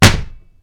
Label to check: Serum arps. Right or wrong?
Wrong